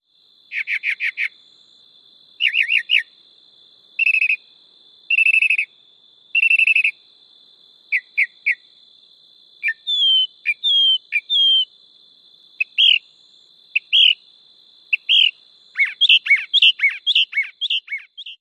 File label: song night; TX